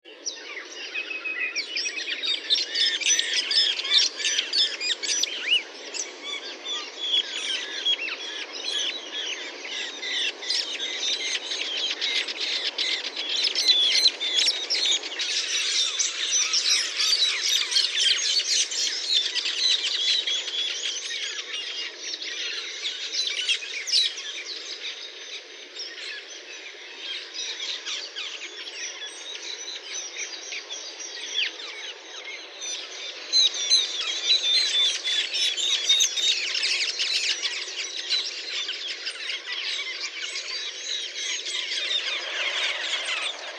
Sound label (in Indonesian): suara perling ungu